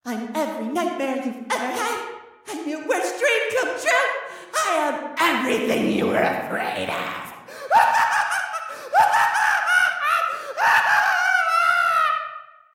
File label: Nightmare Laugh; don t be afraid the first toy